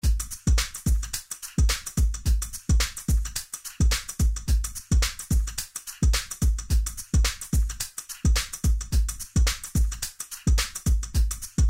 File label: short funky drum loop; Poptropica